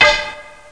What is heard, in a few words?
ROCK; thief